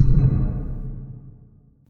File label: boom